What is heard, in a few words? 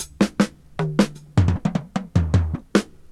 Drum loops, Rolls